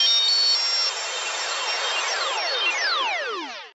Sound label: Astro FX Arcade; Cymatics